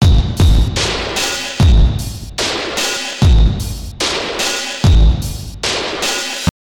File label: Hunt Amb; Canal A